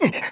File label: damage; MonsterHunter; Fight